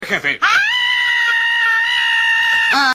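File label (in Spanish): grito, scream; Grito Ned Flanders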